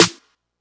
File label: snare